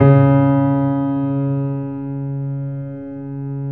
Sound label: PED MF C, GRAND PED; Best Service Advanced Media Trax Modern Composer